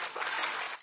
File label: wade, footsteps, run; player; source engine; left 4 dead 2; boomer